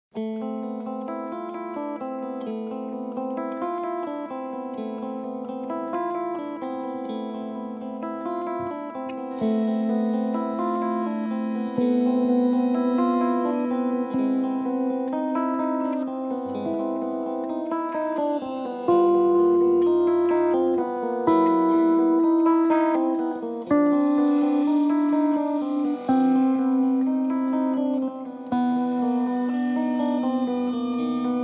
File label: Blues for an Abstract Youth